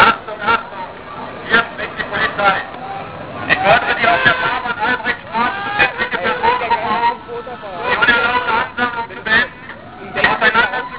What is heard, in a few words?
8bit